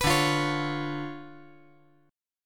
D Minor Major 13th, down

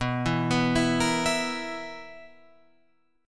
B Major 7th Suspended 4th, Guitar Open F chord; BM7sus4; arpeggiated; 11,11,11,11,13,11

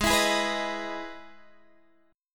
E Major 7th G sharp, chord

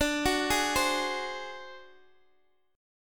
D 7th Flat 5th, Guitar DADGAD

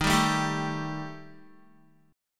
D sharp Major Flat 5th down Guitar; D#Mb5 strummed; 4F,4A,5C,5G,5C,6E; JGuitar

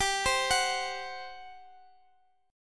G Major 7th Suspended 4th G down Guitar Standard; arpeggiated

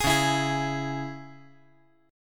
Db Minor Db; strummed; JGuitar

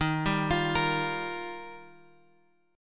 D sharp Major Add 9th; D#add9 Chord {X 6 5 X 6 6} arpeggiated

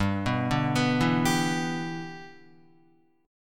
G 6th, Guitar; G6 arpeggiated

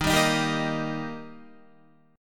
Eb Major Eb Guitar; strummed; JGuitar

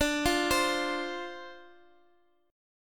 D-Minor 7th D, chord

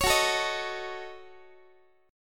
F sharp Minor 6th F sharp Guitar; F#m6 strummed; x,x,16,16,13,14

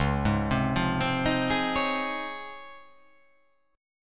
Db Major 9th; arpeggiated; JGuitar

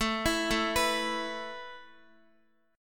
A Suspended 2nd A Guitar; Asus2 arpeggiated

